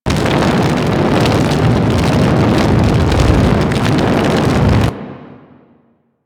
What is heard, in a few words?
Fire Vent Idle Loop; Warframe; Ambience; Corpus Gas City; Gameplay Remaster